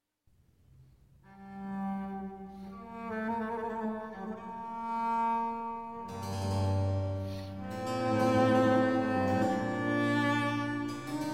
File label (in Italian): Sonata In Sol Minor, Adagio, Violoncello e Basso Continuo; Op2 No4; B Marcello; Sinfonie and Sonate del settecento italiano per Violoncello e Basso Continuo